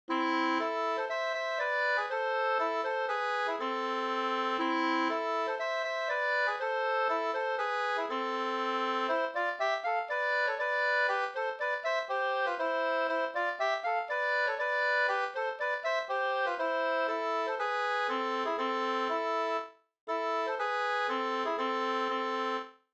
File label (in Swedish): folkdans; Valborgsmassodans